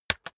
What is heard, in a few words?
keyboard external space hit fast